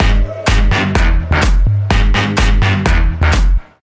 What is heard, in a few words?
Beats